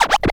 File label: X SCRA L, RECORD-FX; XP RECORD-FX; Best Service; XXL Production Effects